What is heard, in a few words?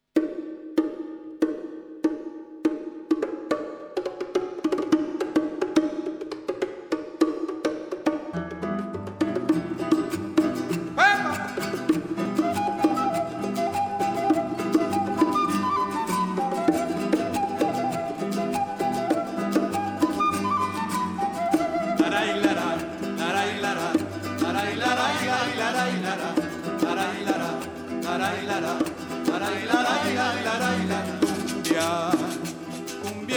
cumbia negra